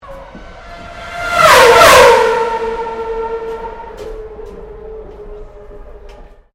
CAR RACING F1 PASSING X2 BENEATH BRIDGE, F1 Grand Prix practise